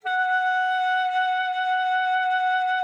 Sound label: F#5, alto sax; Xsample VOL-2